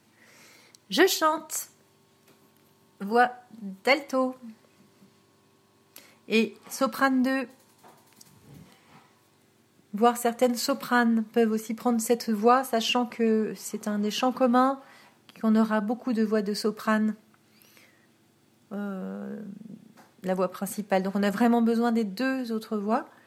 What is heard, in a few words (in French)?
alto et soprano